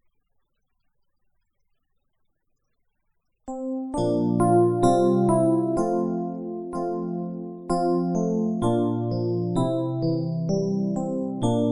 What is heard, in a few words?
Ding Dong Merrily backing, piano